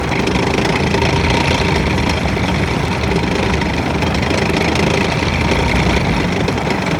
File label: tank engine medium loop; Hearts of Iron IV Death or Dishonor